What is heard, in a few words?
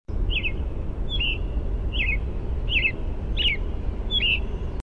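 American Robin song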